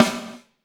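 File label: snare drum, Drums; Bob Clearmountain Drums I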